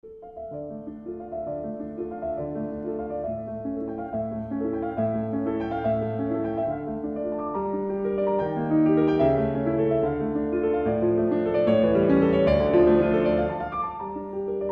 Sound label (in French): piano sonate; francois frederic guy; beethoven; en public